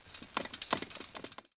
wheelchair

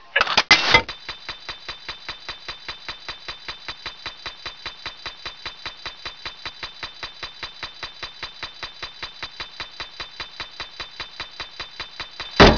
timer; grentimer

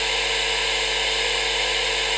trailer tv; ambience

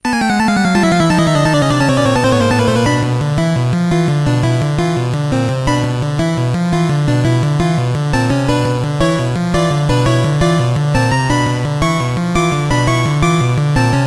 battle theme

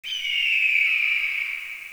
eagle

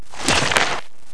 zombie crawl left arm

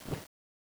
fists miss, weapons

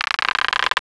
bridge creak

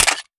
m95 clip out, weapons

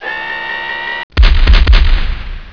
gunner fire gun right

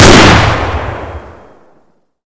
assault, weapons

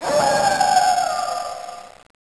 dog howl

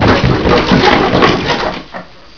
bust crate, debris

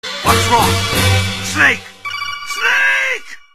sneeik

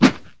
knife slash; rtcw

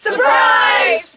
surprise

